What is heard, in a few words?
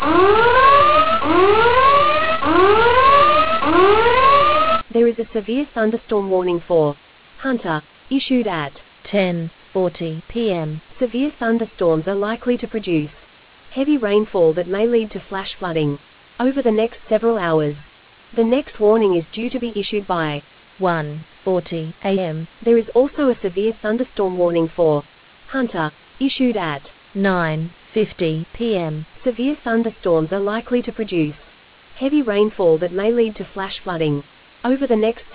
storm audio